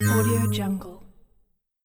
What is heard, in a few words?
positive game notification